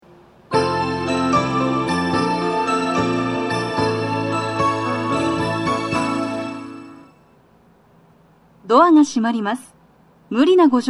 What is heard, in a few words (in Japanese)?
hasyya, 発車サイン音; 1番線 西船橋・東葉勝田台・津田沼方面; 南砂町